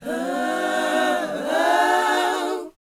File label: BOYZ, Vocal, R&B Dance